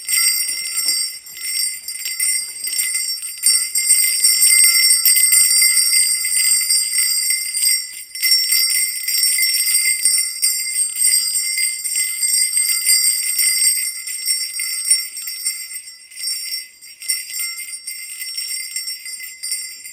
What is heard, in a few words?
black 3 bells